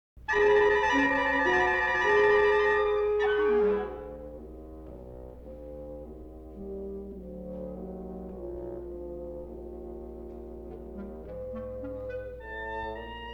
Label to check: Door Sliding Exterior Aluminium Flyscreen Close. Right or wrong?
Wrong